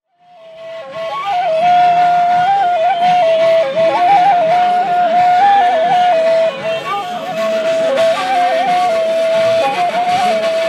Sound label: flute; soir; tunisie; spectacle Revolutions